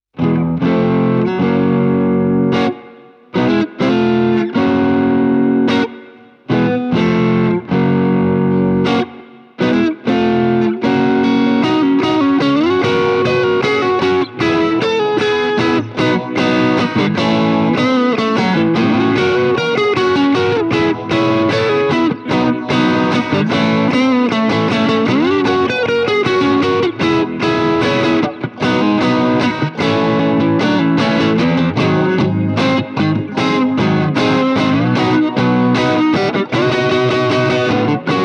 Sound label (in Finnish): vain kitarat; Musamaailma kitarapaketti; demobiisi